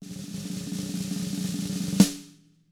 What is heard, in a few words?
ROLL, SNARE ROLLS; ILIO; Double Platinum Drums 1